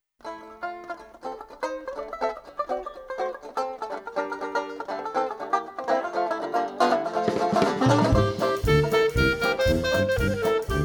Quartet, Dixieland Jazz; Live Band; Hotlanta Dixieland Jazz

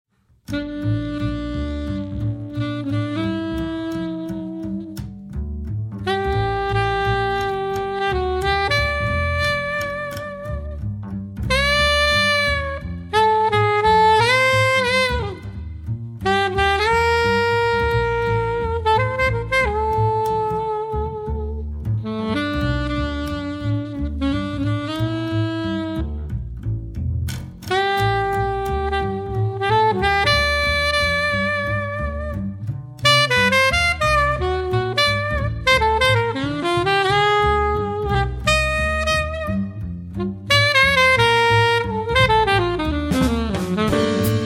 jazz quartet; Kurt Lund Quartet

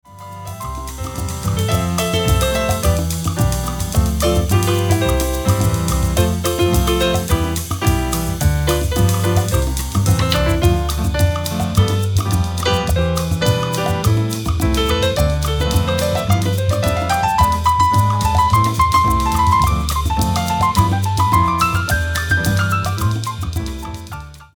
Samba, Ensemble

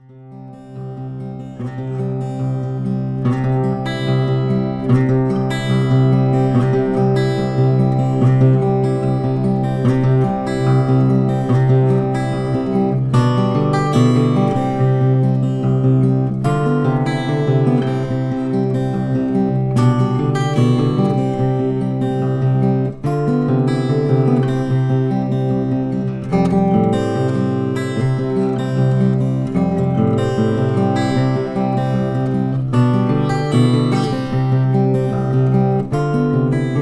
Guitar

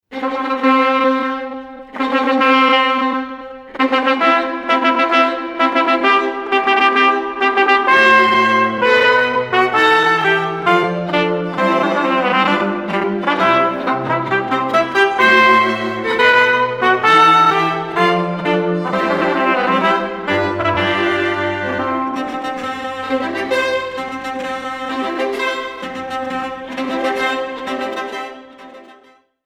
Wedding March, classical string quartet

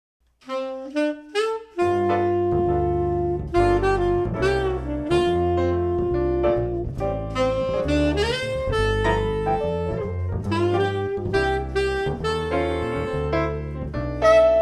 Trio, Jazz Band

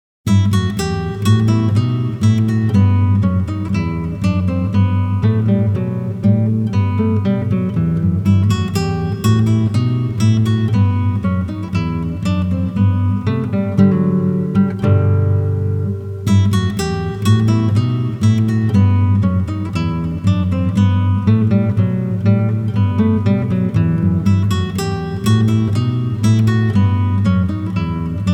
bouree, classical guitar; from the third Lute Suite bwv 996

